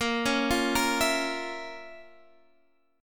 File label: F Major 7th Suspended 4th Sharp 5th A sharp